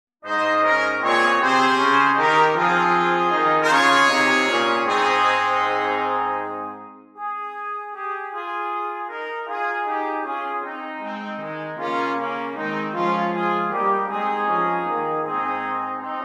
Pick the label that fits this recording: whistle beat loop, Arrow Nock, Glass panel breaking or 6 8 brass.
6 8 brass